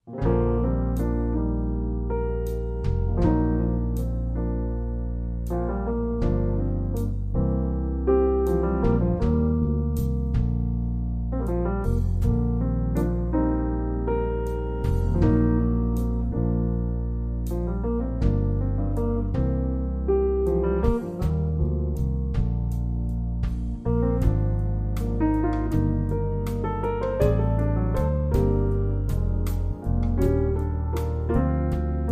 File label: With Trio